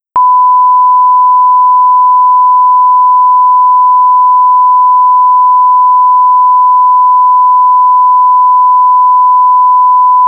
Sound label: 1kHz